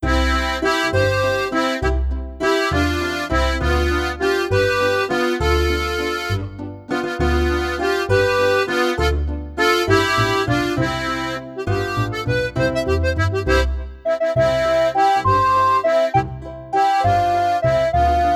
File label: alpine volksmusik